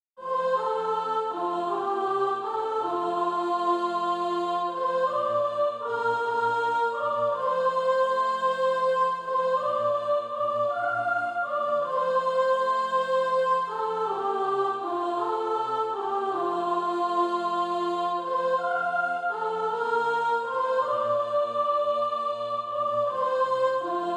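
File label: soprano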